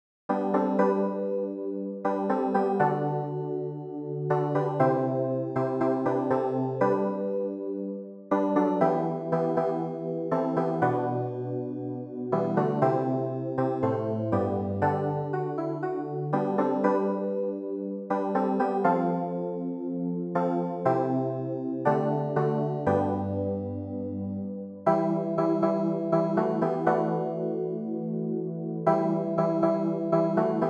clavier

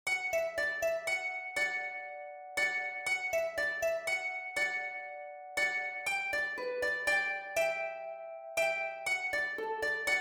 HARPE

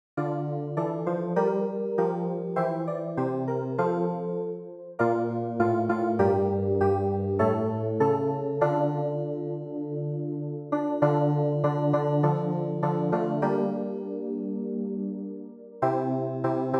CLAVIER

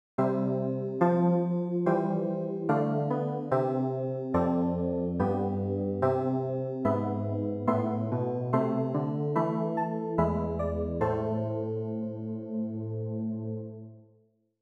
CLAVIERS

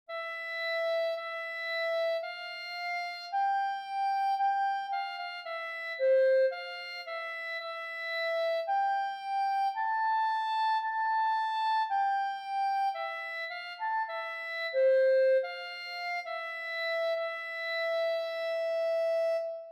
CLARINETTE; DOXOLOGIE solennelle

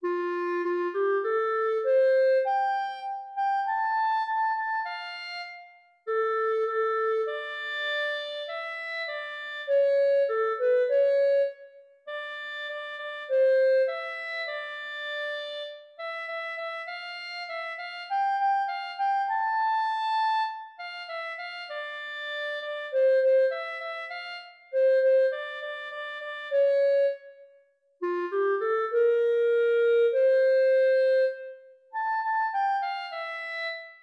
CLARINETTE

